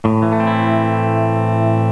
a minor, scales

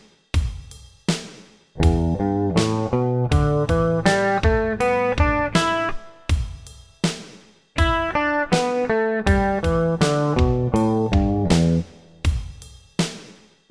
e m pent open

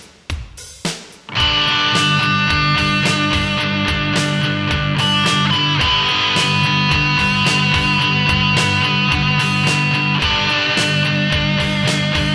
power lead

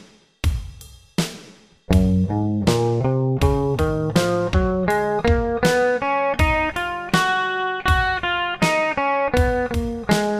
F sharp major, scales

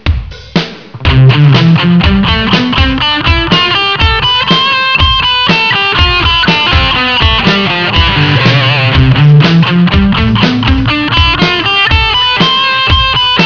c major s8, scales

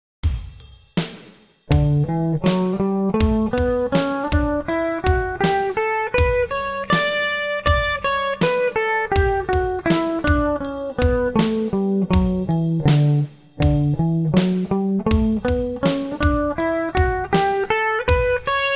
d maj 10th

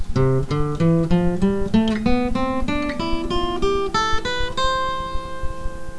c major exercise

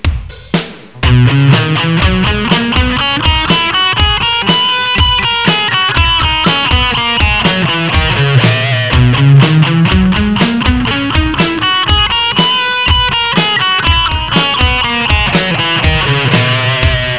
b maj 7th

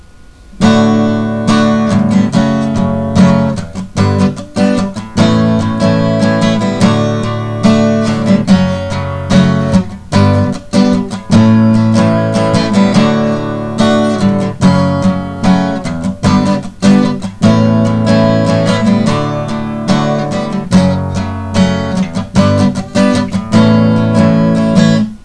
d major pro

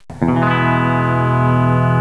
e major, scales

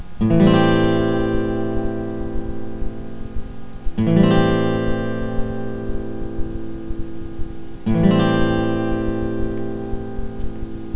am7 chord

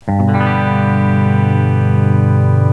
g major, scales

